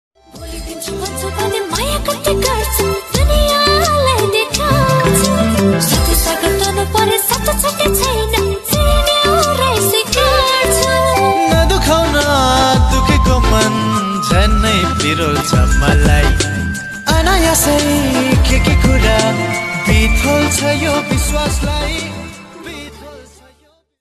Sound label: nepal romantic